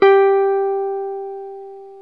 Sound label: Jazz Guitar